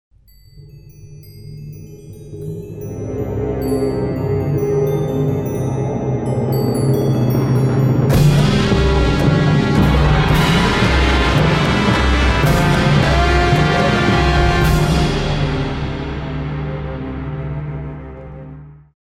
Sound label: Snow Day Opening